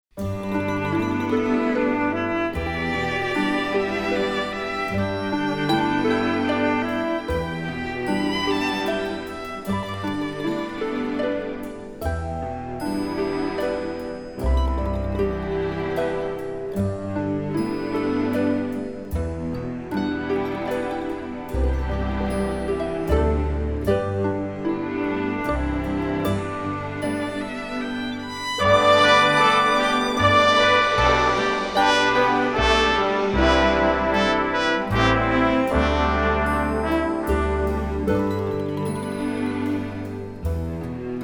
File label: Arirang,recorder; recorder karate